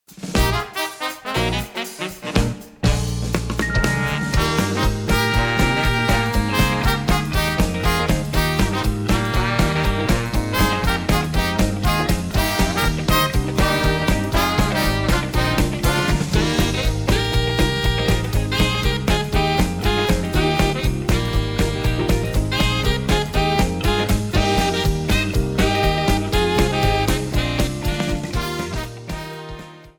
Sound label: Jazz Arrangement; Chain-Link Charge